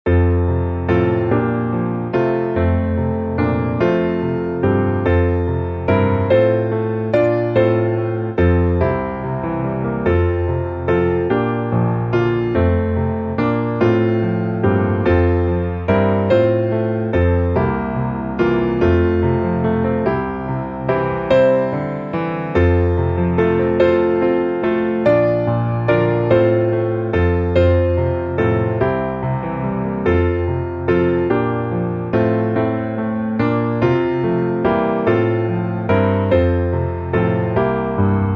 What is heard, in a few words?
piano